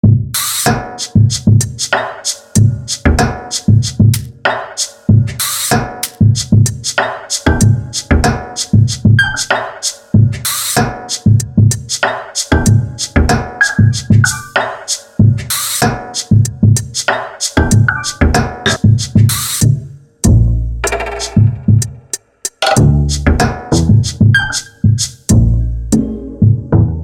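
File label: guzheng kit, acoustic drum machine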